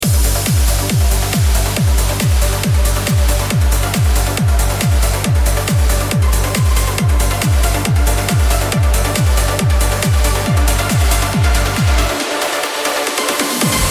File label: Uplifting Only, Transition